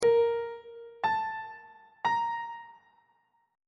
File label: b flat 8th interval pitches on treble clef